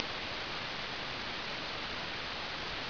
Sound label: white noise